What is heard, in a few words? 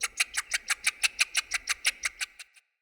Tree Pine Critter; Trees; Midrath Island; Ambience